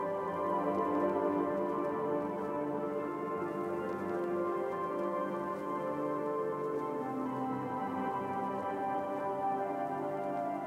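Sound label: music stem ambient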